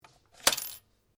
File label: RIFLE REMMINGTON700 7.62MM BOLT ACTION MALFUNCTION; A selection of domesttic firearms; Rifles